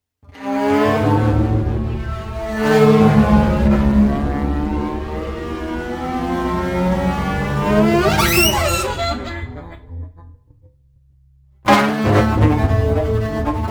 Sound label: E-Violin improv